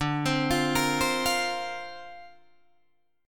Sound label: D-Minor 7th Sharp 5th D Guitar DADGAD, chord